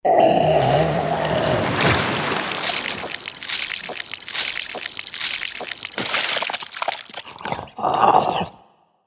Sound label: Suck Attack; Brain Sucker